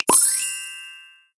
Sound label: Candypet Egg Open; candy crush saga gamerip